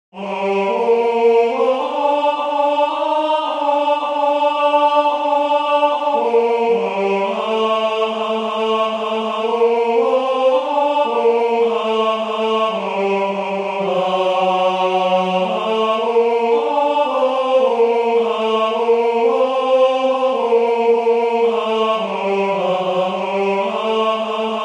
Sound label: tenor; Three Ravens